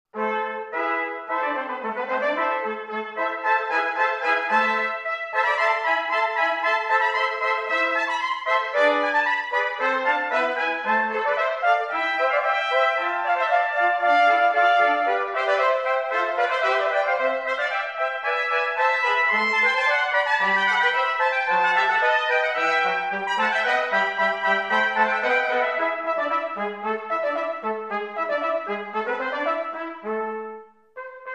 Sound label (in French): concerto pour cinq flutes